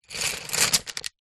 office pencils x30 pick up from carpet

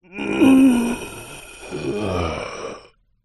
human breath last dying

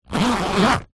office pencil case small zip

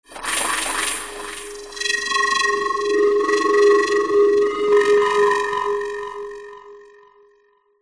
sound design stuttering white noise whine; zapsplat

